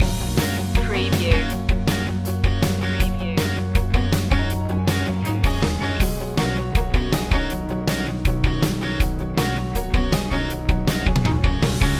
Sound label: loop